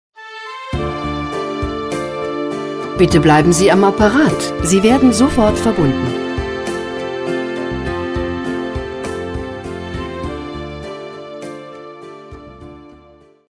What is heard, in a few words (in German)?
wartemusik; spielhoff